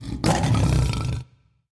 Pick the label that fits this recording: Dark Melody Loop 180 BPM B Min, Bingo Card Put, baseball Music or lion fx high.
lion fx high